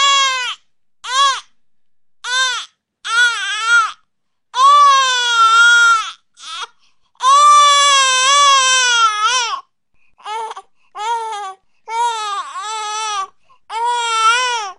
Baby Crying Sound

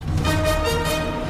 Wavy

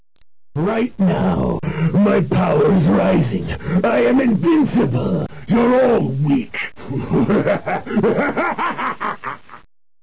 Power; Brolys

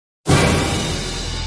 Rising Beat

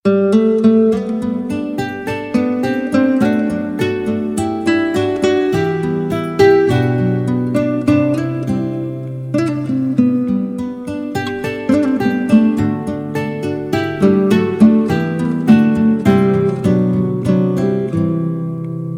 Guitar Ringtone

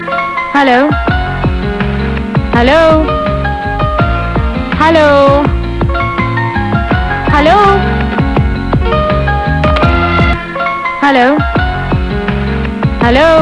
Cute Voice

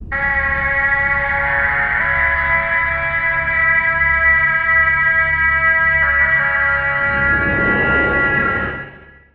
Trumpet; Hell

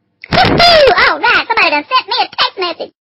Whoo Hooo Text Messa

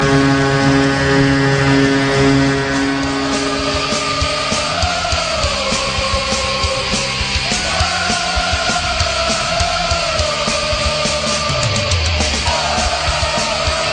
Hornsong

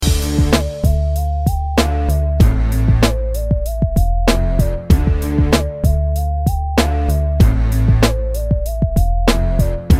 Business Tone

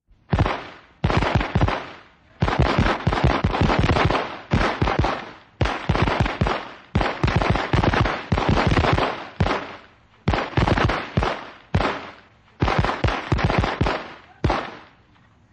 Firework

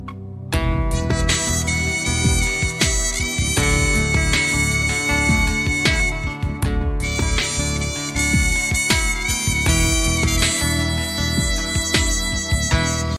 Instrumental; Kaante

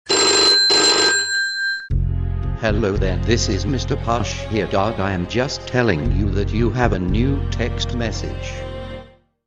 Txt Tone

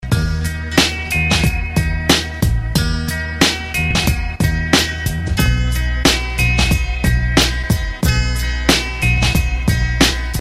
Cool Rap Beat

